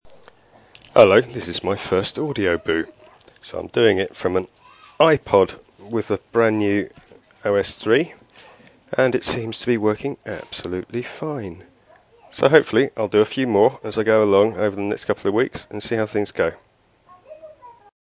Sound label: booing; ipod